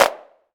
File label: subtle reverb clap one shot D key